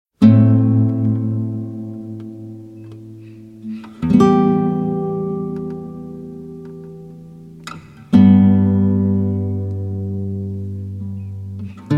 Guitar; From Inception

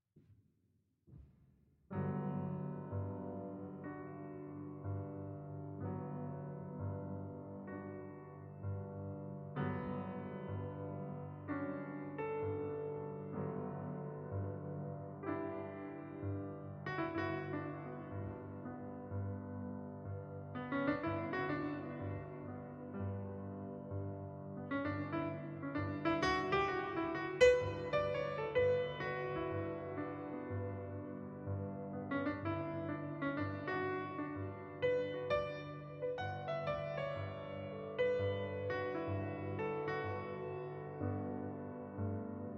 Jazz Lounge

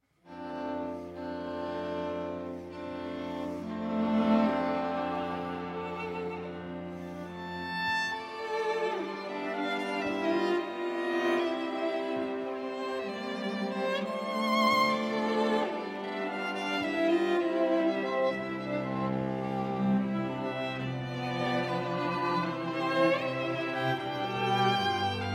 String Quartet in A Minor, Andante Cantabile

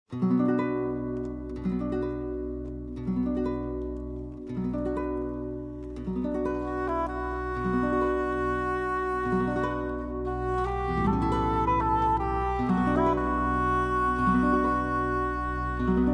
Concierto De Aranjuez For Guitar And Orchestra; 2. Adagio

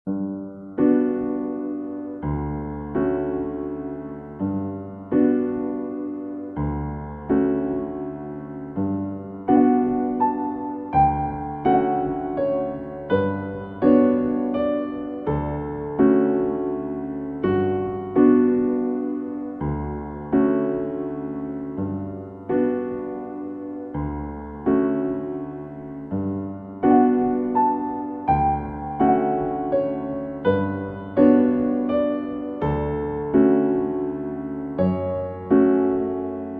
Classical Study Music - Gymnopedie No. 1

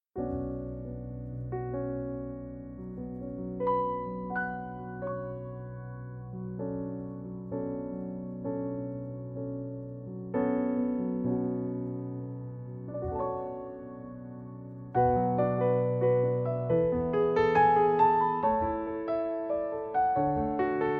Piano Version

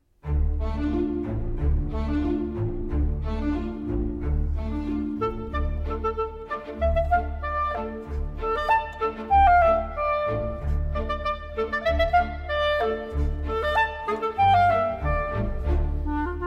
For Solo Clarinet & Orchestra; From The Terminal

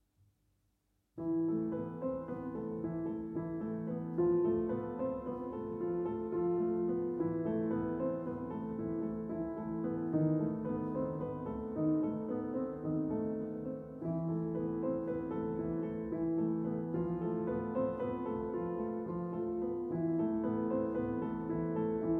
Piano Duet; La Belle et la Bête; VI. La Confiance de la Bête

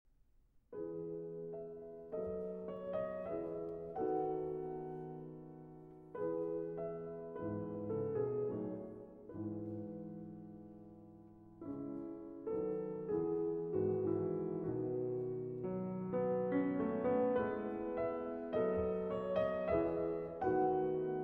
Piano Trio in A Minor, Tema Andante con moto

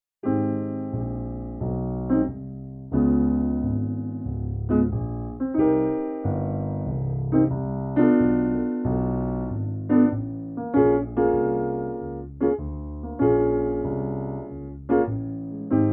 Relaxing Morning Jazz; Smooth Jazz; Jazz Lounge

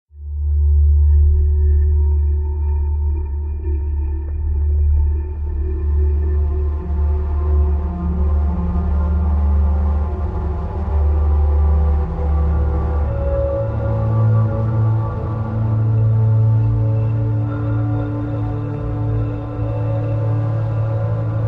Piano & Synth

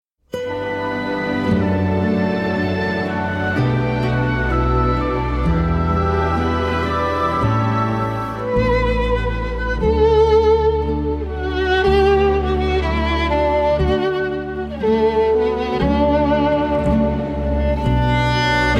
For Violin and Orchestra; Foster; Arr. Garrett Van der Heijden

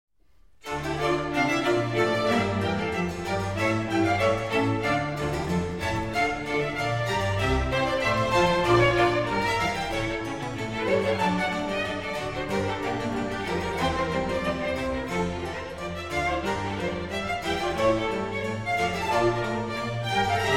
Oboe Concerto in D minor; BWV 1059r Movement 1

